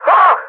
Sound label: pain; custom monsters; mercs